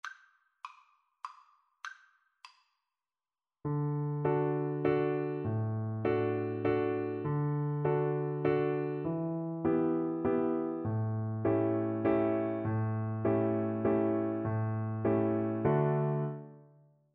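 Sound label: viola karaoke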